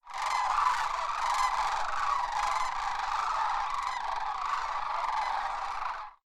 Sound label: Grus canadensis, Denali National Park